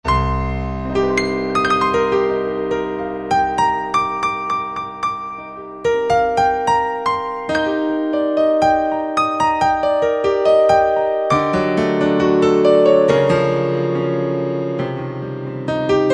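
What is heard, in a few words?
Grand Piano, Yamaha QY70; XG Voice examples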